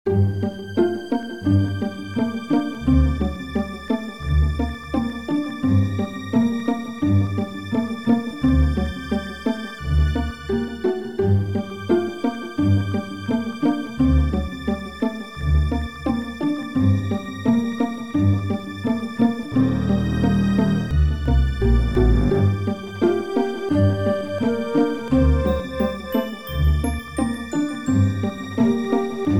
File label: Suspense; Forbidden Forest 3